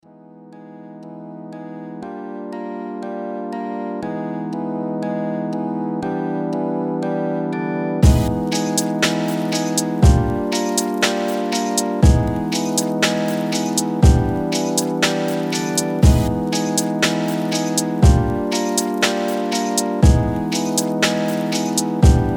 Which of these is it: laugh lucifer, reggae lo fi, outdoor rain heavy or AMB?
reggae lo fi